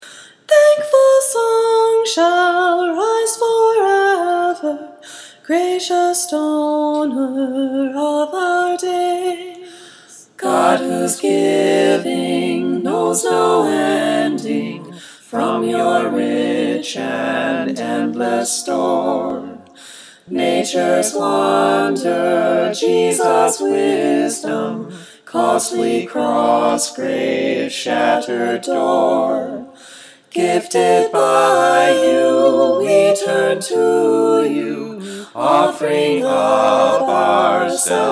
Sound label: God Whose Giving Soprano